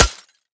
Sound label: glass, bullet impacts; physics; Half Life Model Pack